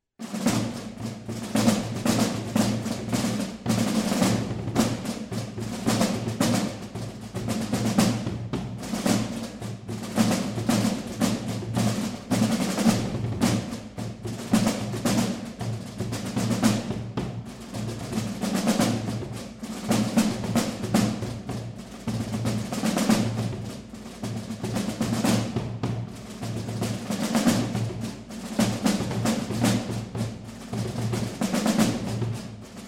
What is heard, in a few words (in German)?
Tambouren